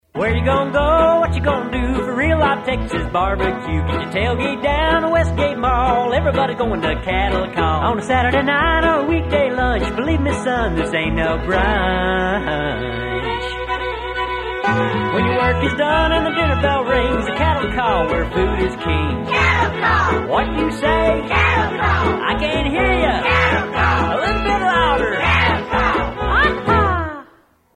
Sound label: Full Sing